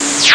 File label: plasma laser, electronic sounds; Sound Effects for Multimedia - Volume 1